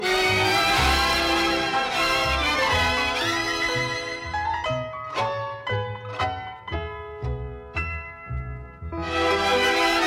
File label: tango